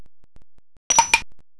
ting

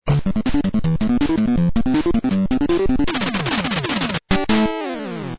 win; svencoop

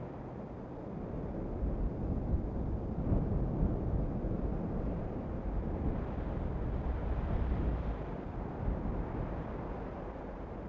ambience wind indoor; dmc

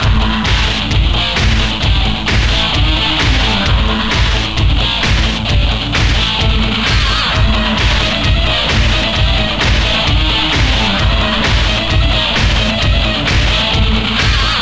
rockin

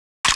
eagle deploy, weapons; svencoop

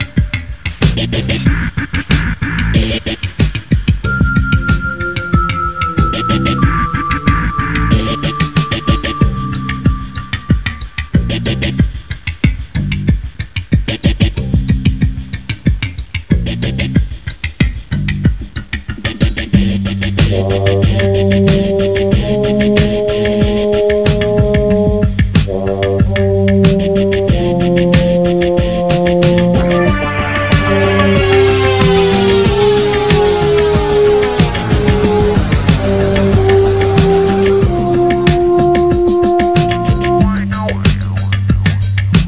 BG MUSIC; svencoop

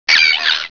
headcrab pain; gearbox

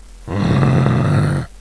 hit; brainbread

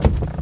wood

